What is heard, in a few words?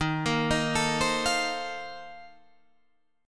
C Minor 13th Eb